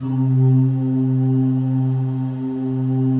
PAD; Best Service; XX Large; Pads

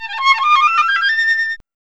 FLTRIF; E-Lab; X Static Goldmine 1

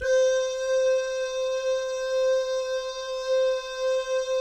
"DOO" GUYS, Vocal Jazz Fx

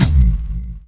BD PHAT; E-Lab; X Static Goldmine 2; akai

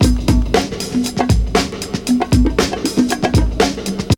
BREAK

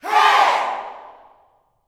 "Hey", Vocal Gospel yell shout; Spectrasonics; Vocal Planet Vol.1 Gospel; akai

